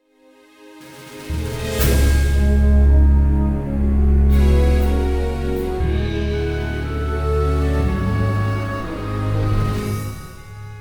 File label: victory, music; wesnoth